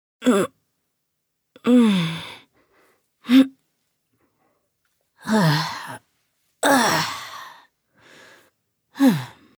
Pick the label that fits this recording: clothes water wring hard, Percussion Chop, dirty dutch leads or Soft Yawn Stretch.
Soft Yawn Stretch